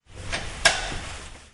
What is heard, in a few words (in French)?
Bruitages interrupteurs